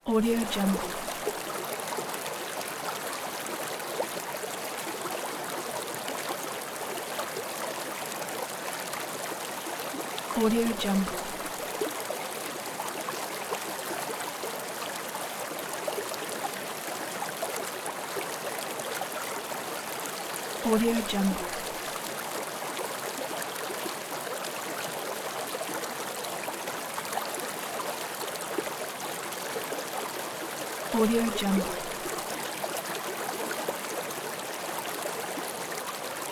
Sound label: river